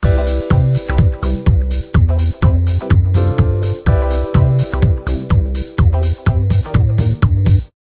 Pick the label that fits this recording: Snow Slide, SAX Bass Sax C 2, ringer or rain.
ringer